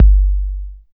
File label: 808 KICK; East West - Phat & Phunky